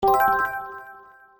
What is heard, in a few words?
success